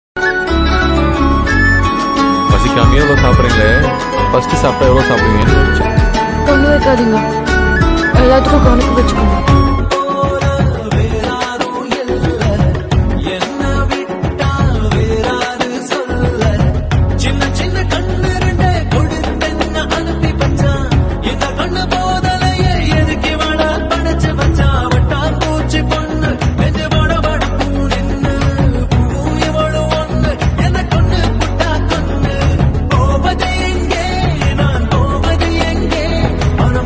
love song; paiya; tamil

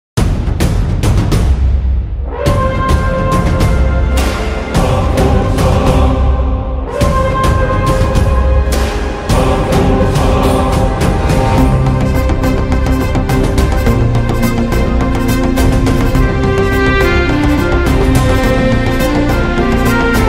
tamil bgm; samantha; shaakuntalam

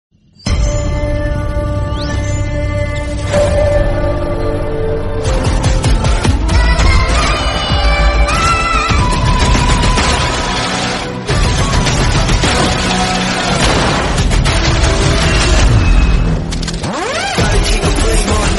agent movie bgm ringtone

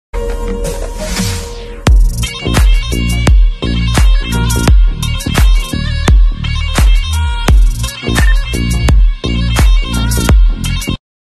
bgm ringtone bgm instrumental; the southsoul

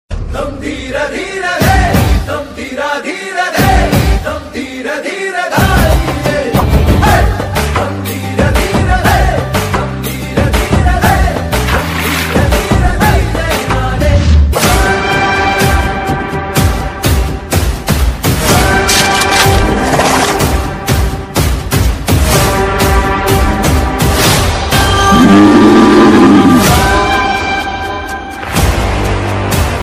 teaser bgm ringtone telugu bgm

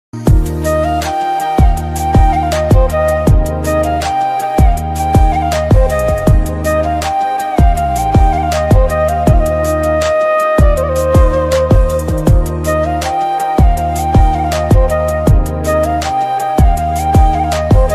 romantic ringtone flute ringtone mobile ringtone

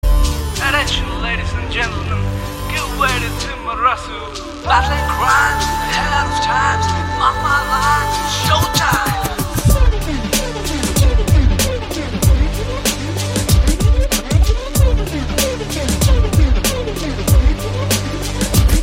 Drums Beat; The Thimmarusu

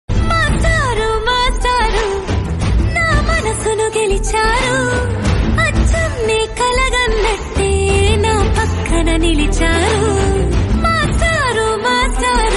song telugu ringtone

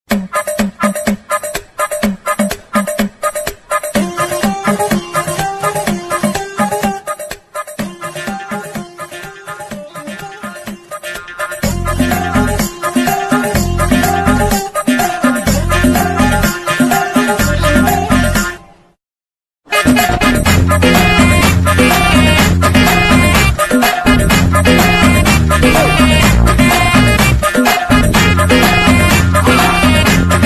funny bgm ringtone; vip movie

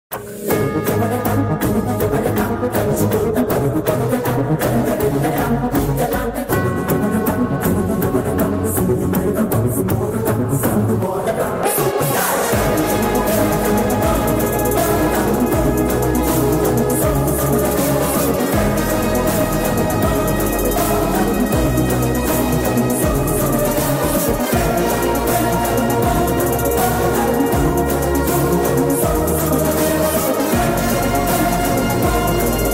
interval bgm; sarkaru vaari paata

